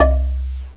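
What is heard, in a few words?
MARIMBA C1; Alone In The Dark Demo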